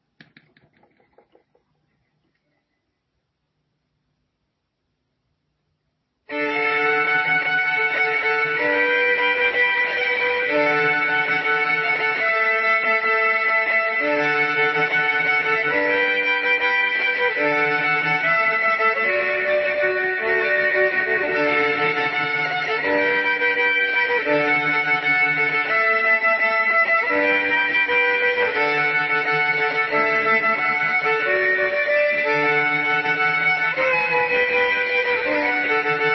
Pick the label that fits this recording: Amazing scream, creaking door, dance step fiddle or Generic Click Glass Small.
dance step fiddle